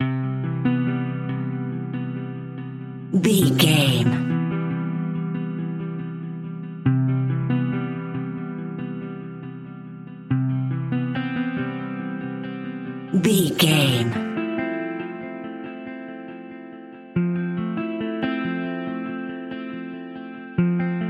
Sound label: coastline views guitars only